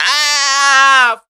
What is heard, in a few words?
"ah" LONG, Voice; ooh ahh